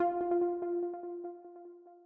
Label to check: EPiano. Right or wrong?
Right